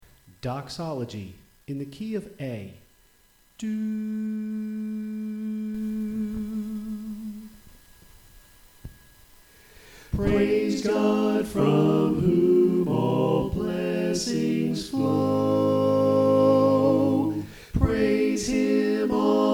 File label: Bass Left